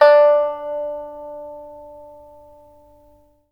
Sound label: PIPA D5; Spectrasonics; Heart of Asia